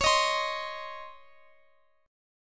C sharp Major 7th Suspended 2nd C sharp, Guitar Open F; strummed; x,x,x,20,18,19